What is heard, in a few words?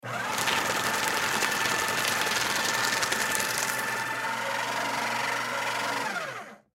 Paper Shred Single Sheet Of Paper; Paper Shredder Single Sheet Shred; Office; Blastwave